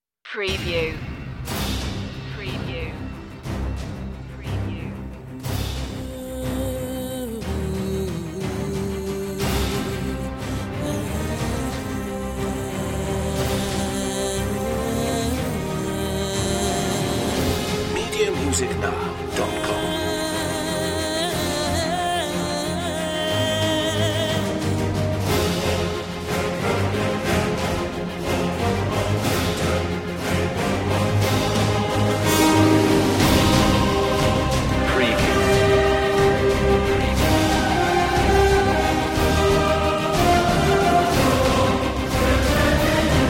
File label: choir solo voc